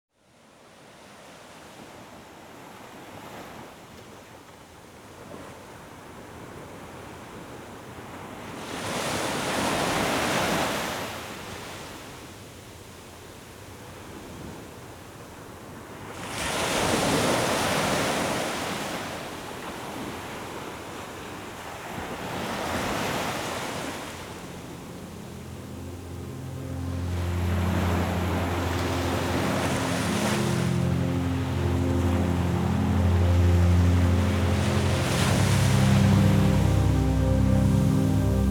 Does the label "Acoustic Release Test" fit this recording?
No